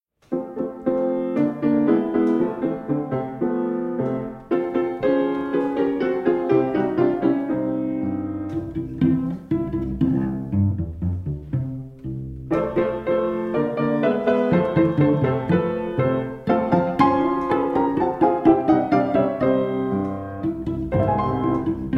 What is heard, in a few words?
Sonate